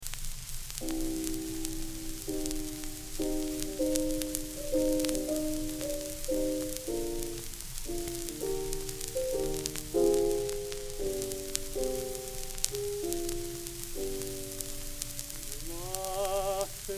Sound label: Polyphon